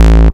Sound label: Bass A, Offbeat Bass; VEC1; Club